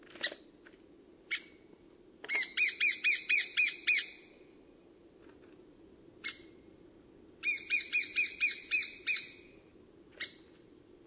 pikkutikka kiikitys; Jannes Hannu

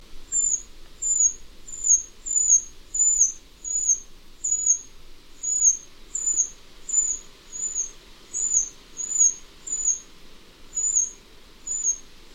puukiipija; Tero Linjama

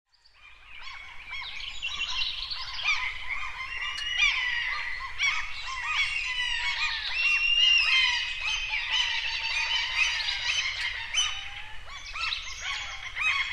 kalalokki; Kailottava ”keä” tai ”kiiiä”, ääni kimeämpi kuin harmaalokilla; birdlife

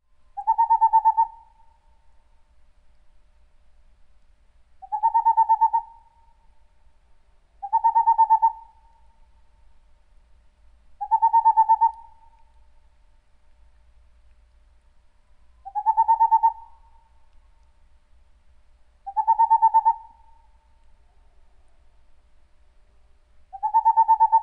helmipollo; kutsuääni kuiva tiuskiva ”tsji”; birdlife

